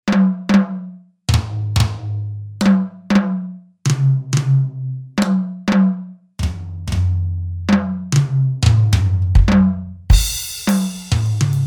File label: boost snr off